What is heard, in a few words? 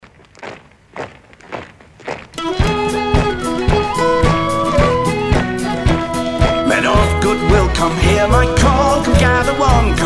The Yuletide Drum